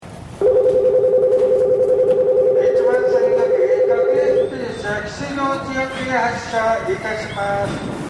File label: bell; 発車ベル; １番線